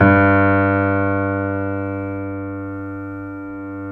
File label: KEY, YC7 Piano pp